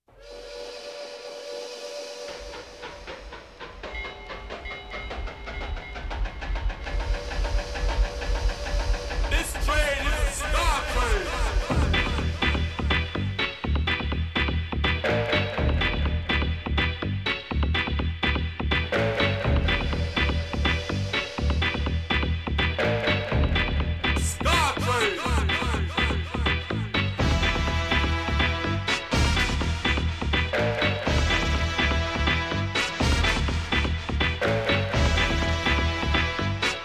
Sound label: 124 bpm